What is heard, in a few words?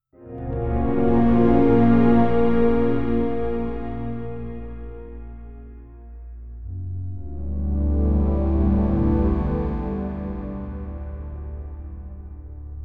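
ominous suspenseful unnerving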